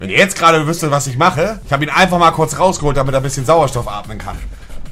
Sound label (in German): sauerstoff atmen; montanablack88